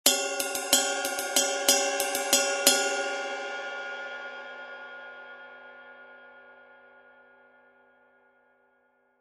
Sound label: 22in Custom Ride, cymbal